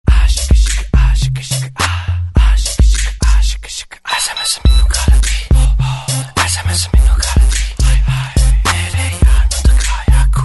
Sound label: Punjabi